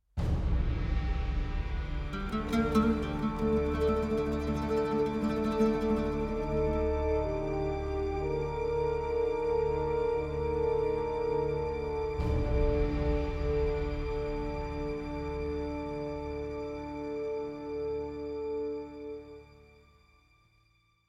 Globe Align Stinger; Syria

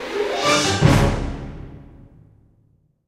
Surprise Stinger; Back to the Future: The Game; Android; PS3; Wii; Xbox 360

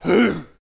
Ogre Hit; The Battle for Wesnoth

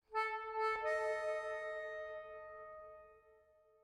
Shake Stinger; Scrap

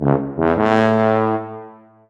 Fan Tuba; Kirby's Extra Epic Yarn; 3DS

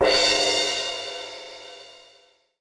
Cymbal; lego my world first steps

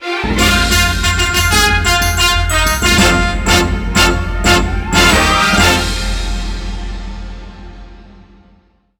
Award Sting; ABC Wipeout 3; Wii U

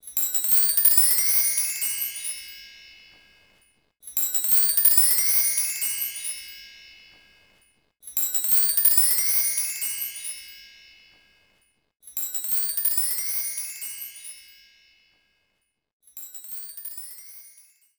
Unlocked; shreks carnival craze party games; ps2 wii windows

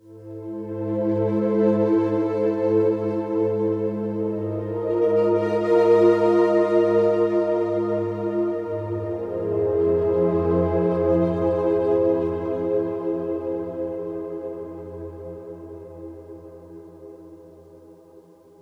Escape Success; SOMA

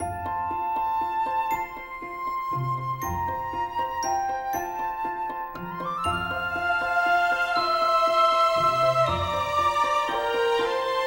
sting baby born; The Sims 3; windows gamerip 2009

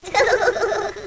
giggle

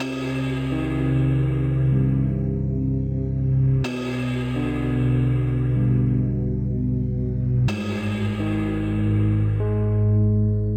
Calm Ambience; max payne gba gamerip 2003

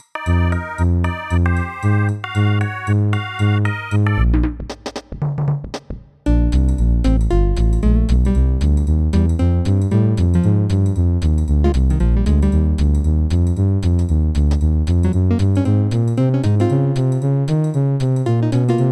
Jazz (Aircheck); Animal Crossing New Leaf Welcome amiibo

